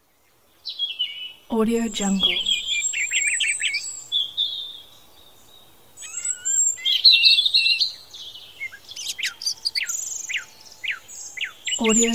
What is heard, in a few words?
birds chirping